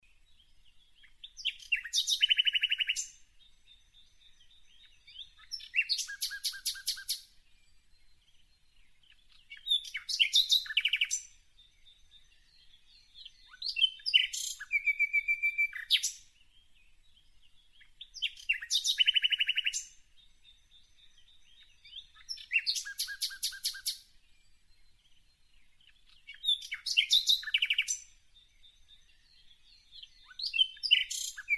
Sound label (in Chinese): 林中鸟语